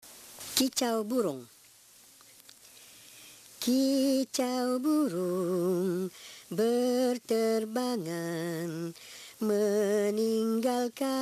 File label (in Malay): Kicau Burung